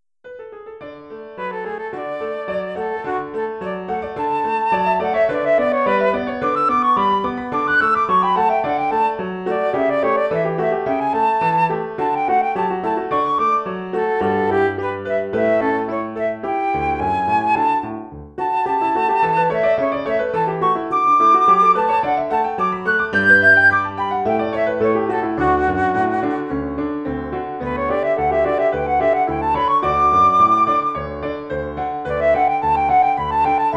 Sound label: CLEMENTI SONATINA flute line